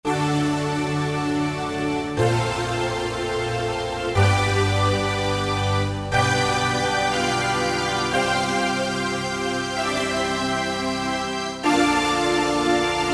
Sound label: Notre Organ; Roland SRX-05 Supreme Dance